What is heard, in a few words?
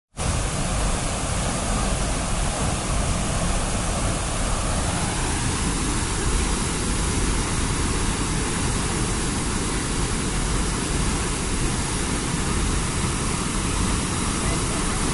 wainui falls